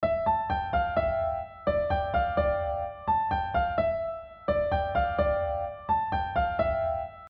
modal melodic ostinato

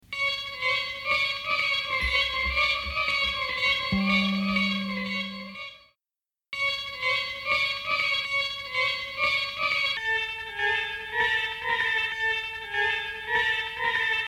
Riff

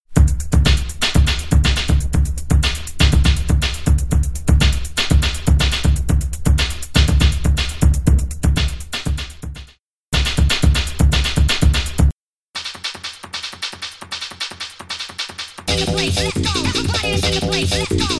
Beat

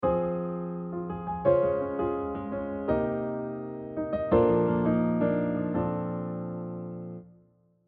pivot chord modulation

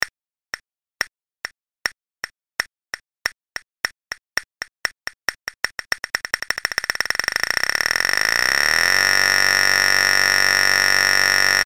Harmonic Series - octave

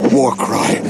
war cry 鬨の声

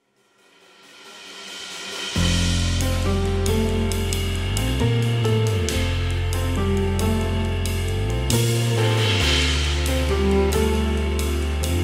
gospel choir

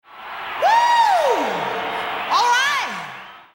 Shout ('wooo')

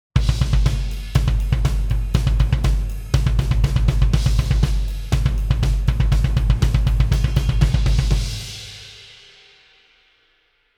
Drums Dry

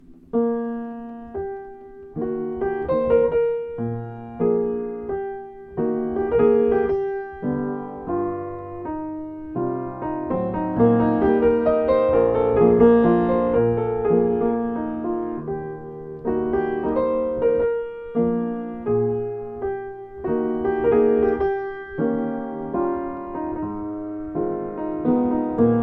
Sostenuto Mib

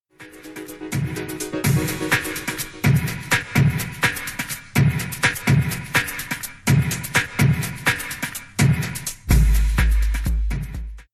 Beat

